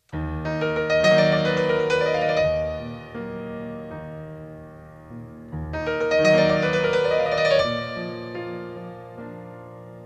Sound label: Piano Solo